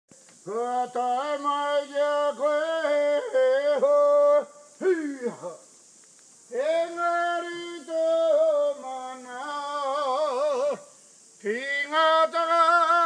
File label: kauri song